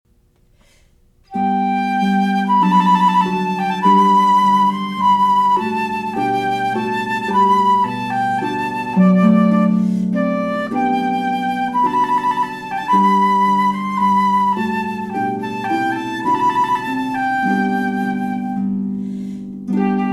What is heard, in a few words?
trumpet